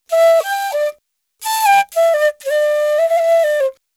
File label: ETHNO EXTRA 90; ethno2